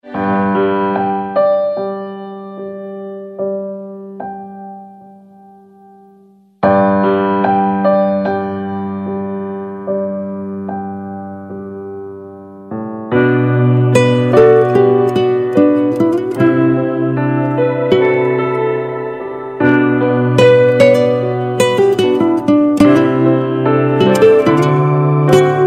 peaceful piano; Electronica

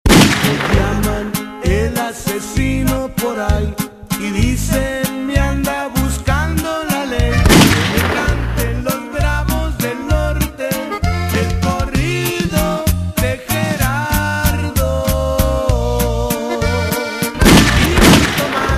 mix corridos; Latin

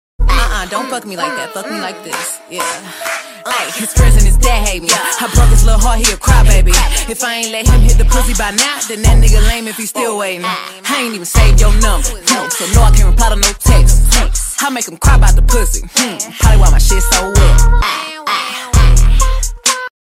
crybaby

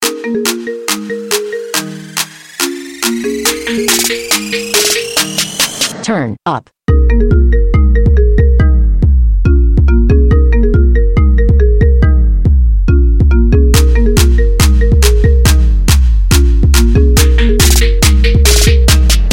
opening ringtone remix; Hip Hop

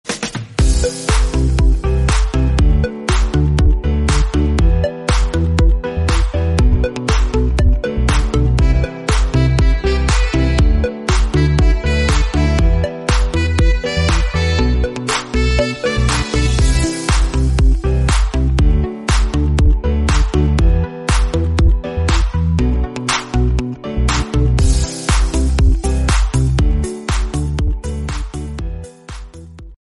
instrumental

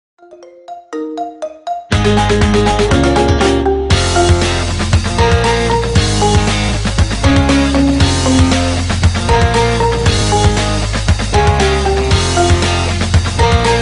marimba ringtone remix

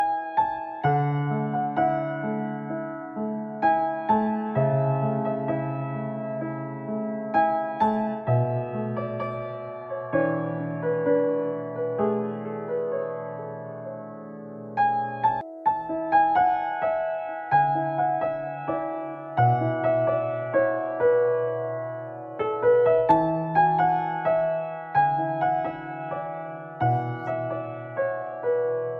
instrument

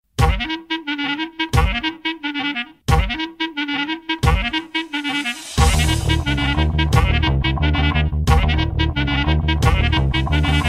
oriental beat; Indian

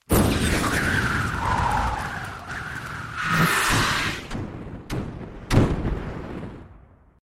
sprint wind; Diablo 3

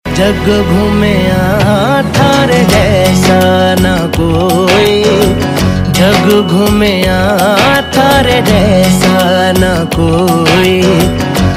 male version ringtone; Bollywood

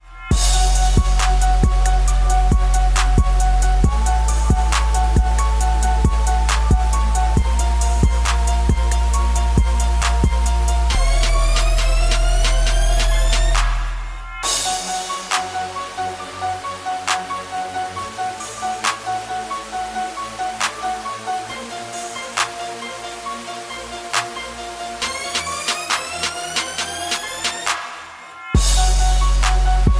halloween hardbeat

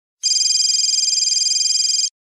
modern cell ring

